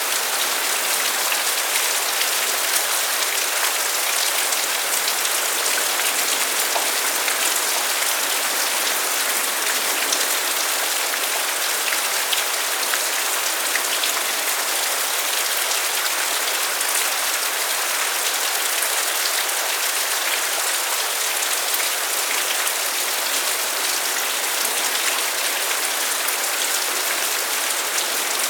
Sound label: heavy rain; OpenValley